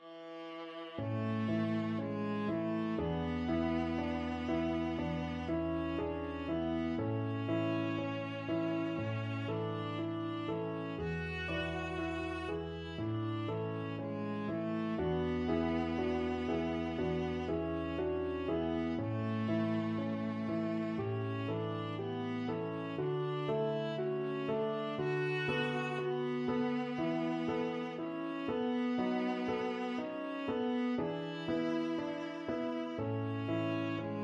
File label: chopin etude, viola